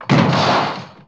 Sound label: KICK DOOR